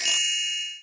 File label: points; Sphere